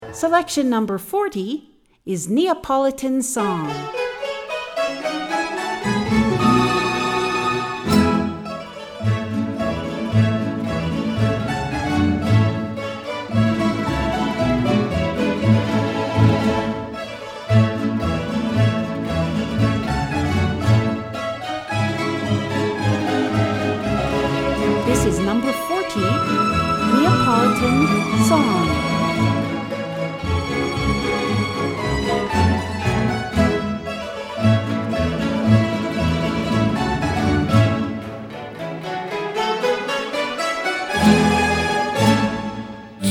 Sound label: Neapolitan Song